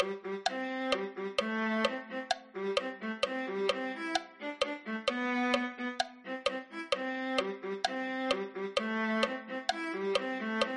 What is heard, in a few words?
viola